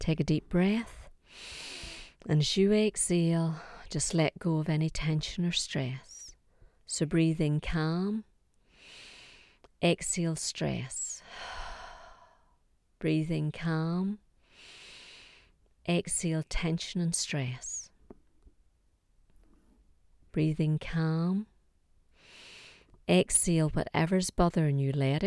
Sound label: Breathe in calm